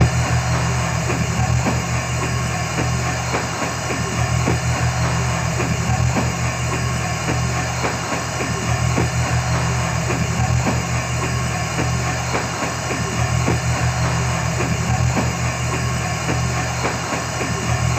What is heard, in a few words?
PLANET SEED MACHINE, SLOW MELTING GROOVES